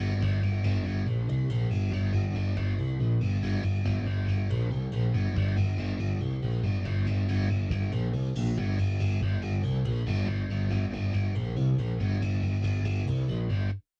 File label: bass